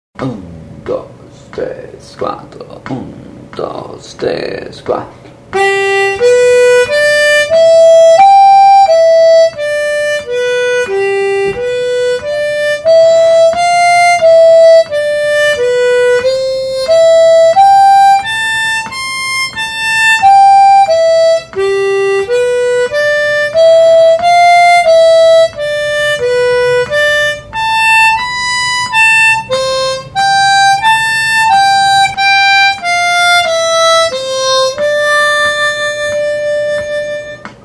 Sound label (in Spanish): boogie pattern, armonica